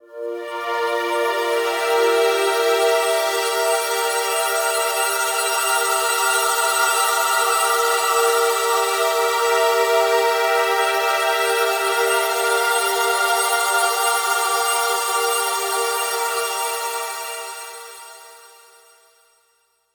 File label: Voice Pad; Pads; Dr. Fink's Funk Factory